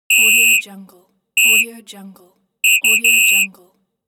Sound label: referee whistle